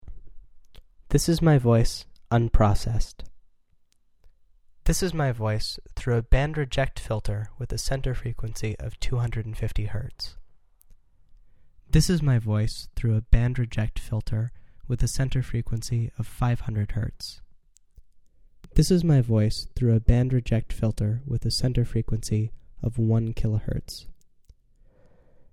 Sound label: bandreject